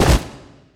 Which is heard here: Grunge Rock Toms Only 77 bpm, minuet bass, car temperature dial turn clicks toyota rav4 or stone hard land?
stone hard land